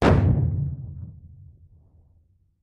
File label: 30 Feet Primacord